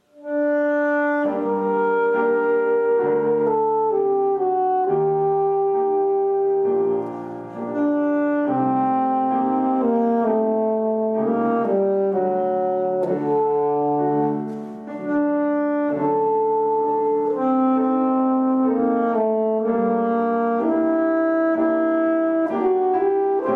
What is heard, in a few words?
Sonata; Solo Accompaniments; Alto Sax; Eccles - Sonata